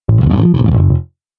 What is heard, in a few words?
Slide; MODO BASS